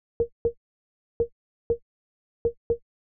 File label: Conga; hydrogen